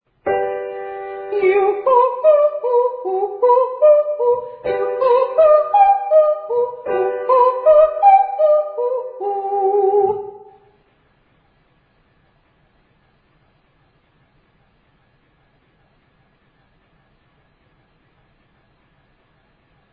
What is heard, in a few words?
Triads Octaves Yoo Very High